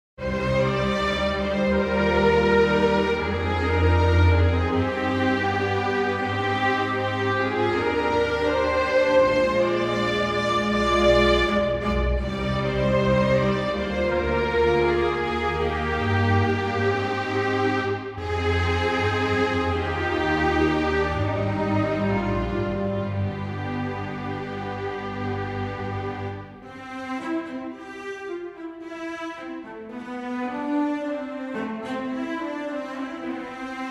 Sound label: orch